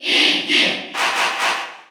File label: Cheer Russian; SSBU